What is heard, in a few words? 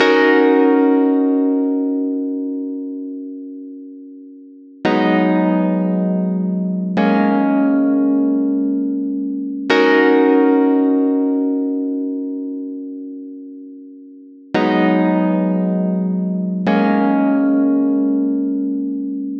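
Keyboard Wet A minor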